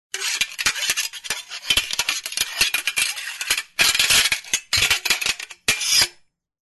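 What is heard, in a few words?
zvuki metalla, metal sounds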